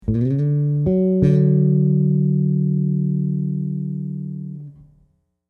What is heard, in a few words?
D to F M3, bass; chords and double stops on bass